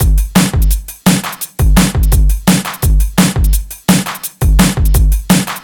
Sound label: Baby Drums C